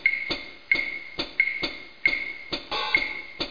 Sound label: load; Bible Fun Pack; BIBLELND